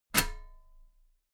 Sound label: metal ladder step heavy